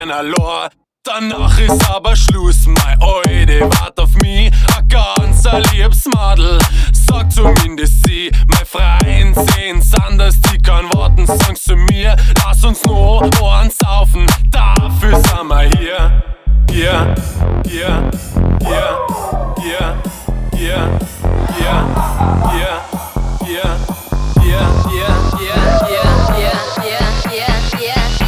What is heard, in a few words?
Electro House Remix